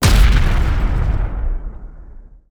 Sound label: skill fire boom explo